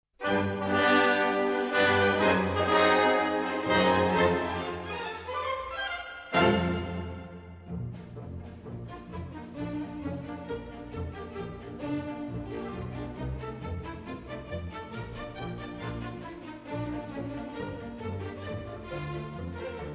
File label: La Fille Mal Gardee Female Variation